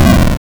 enemy death

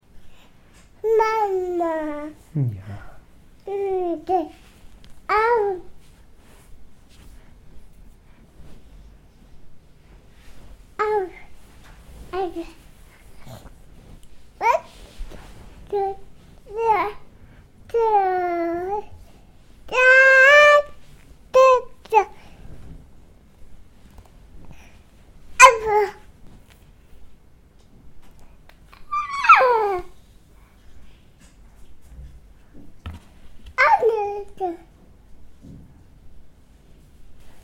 baby

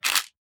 camera shutter

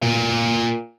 electric guitar

